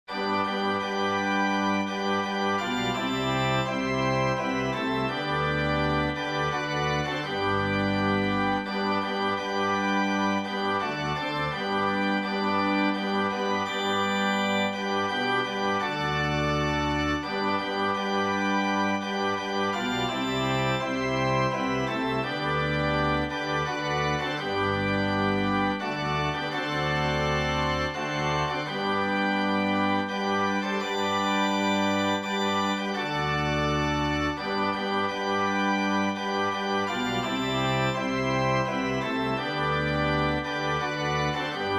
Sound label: close to thee organ